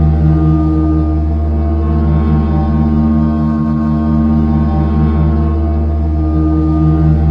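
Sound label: silo ambience; hl2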